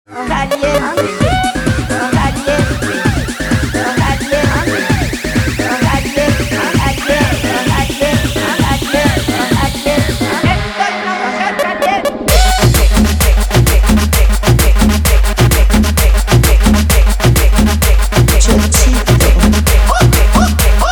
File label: guaracha remix 130 bpm